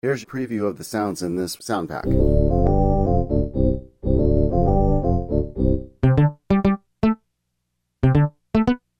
C scale loops; Ugly Stepchildren; Coruscate

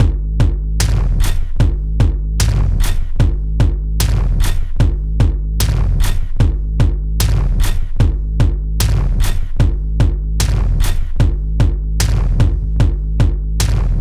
wooom

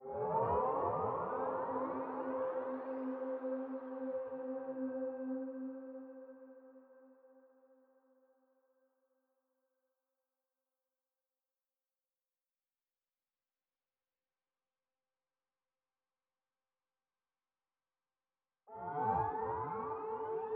Cetacean Meditation; Zenboy1955

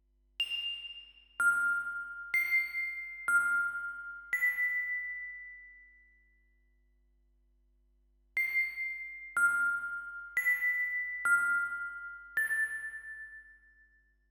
Spacey dream 60bpm; IceBel; Space Dream sample pack

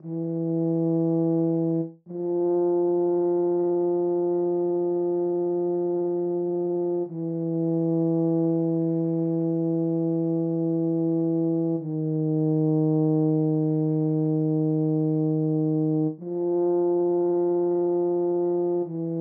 Tuba

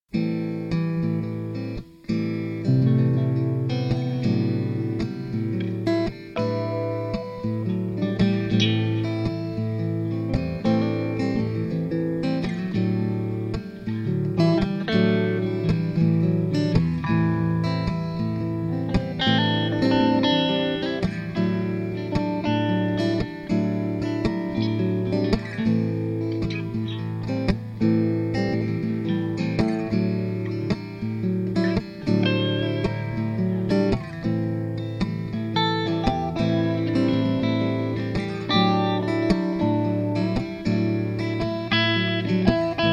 Am112 GTR impro

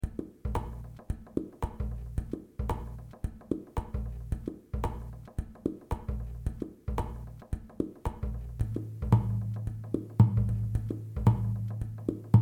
112bpm GTR Percussion

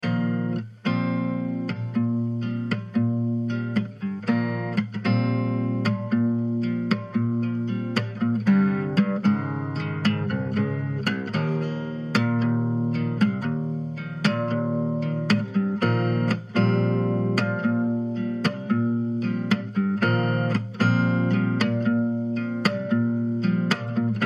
Groove

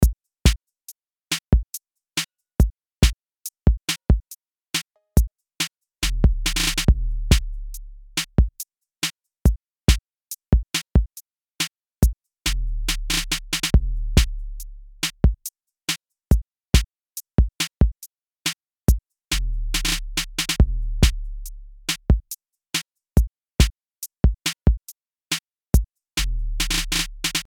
Ordinary Groove

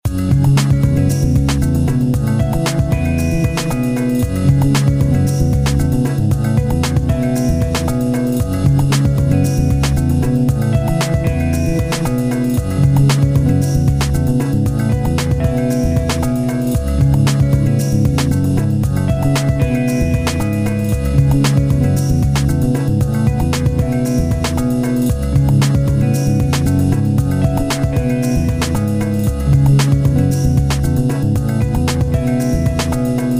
Chill Bass GTR

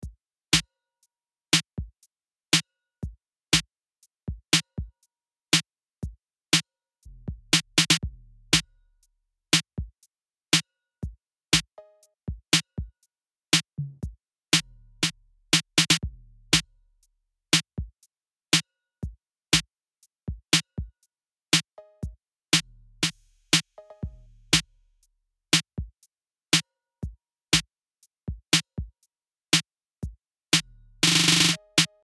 808 Groove

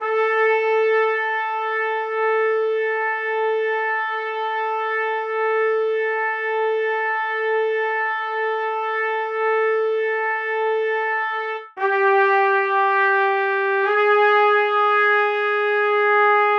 Trumpets

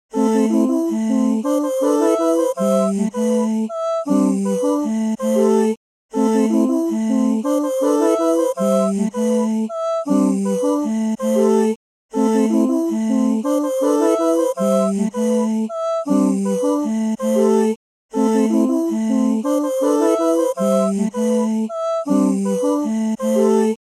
Singing Vowels Loop

